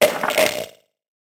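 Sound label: mummy pain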